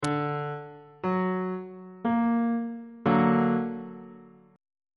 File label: d augmented chord on bass clef